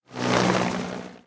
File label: Helicopter By